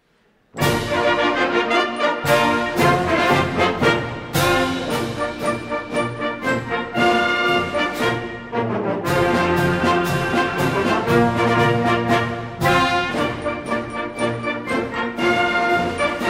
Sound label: marsch